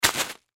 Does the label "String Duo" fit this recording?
No